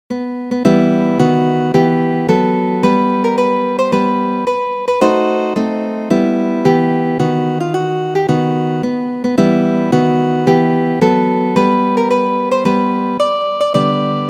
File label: andante afectuoso; Ferdinando Carulli